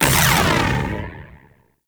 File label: Tenno Ball Angry; Warframe; Missions